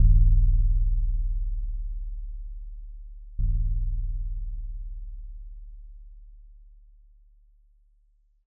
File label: joya bell